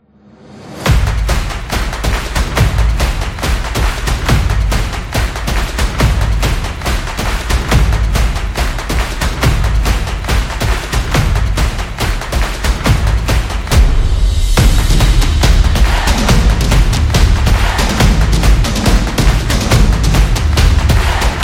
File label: Sport Beat